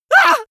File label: male painful shout short; vocals; comedy-cartoon; soundpool